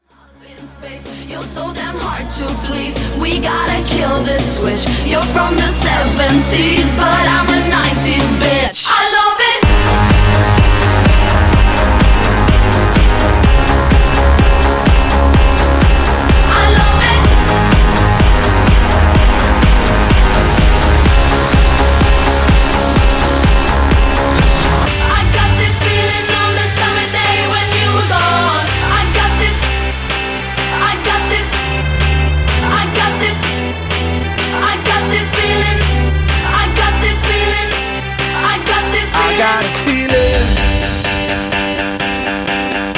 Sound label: Wordplay, 126 Bpm